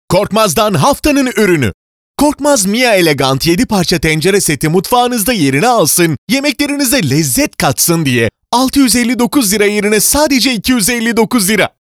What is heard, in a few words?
dry